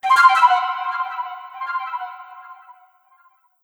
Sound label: Celestica Flute; Pokemon Legends Arceus